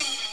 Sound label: menu command; windows